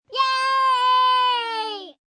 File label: Cheer Yay, 1 young child, Yelling; VoiceBox